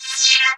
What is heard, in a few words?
VOC HIT, VOCODER; Zero-G Ambient 1